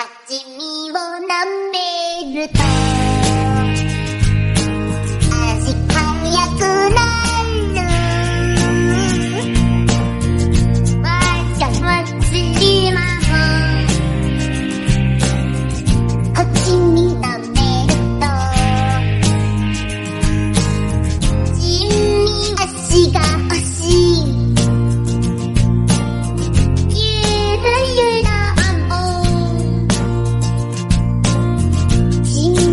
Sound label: bgm